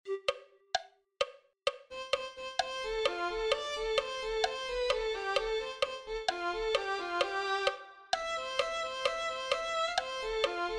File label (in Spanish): violin2